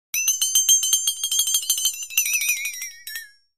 Bouncing Twang ( Metal ); Various Bouncing Multi-pitched Metallic Twangs.